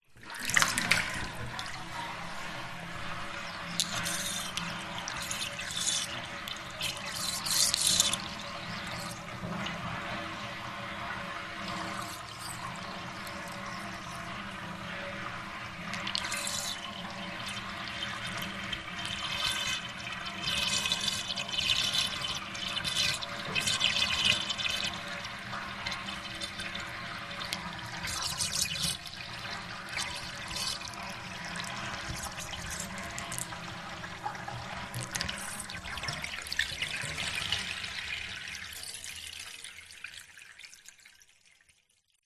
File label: bathtub shallow water drain plug hole; zapsplat